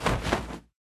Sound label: Victory Flag